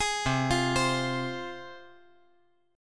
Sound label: C Suspended 4th Sharp 5th, C, down, chord